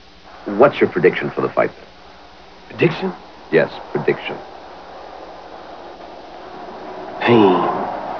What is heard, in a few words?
pain